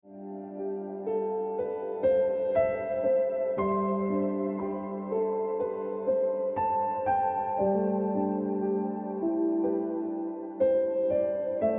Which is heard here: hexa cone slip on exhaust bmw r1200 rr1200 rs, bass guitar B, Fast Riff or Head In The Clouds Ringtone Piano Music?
Head In The Clouds Ringtone Piano Music